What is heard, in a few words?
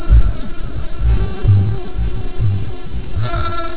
jazz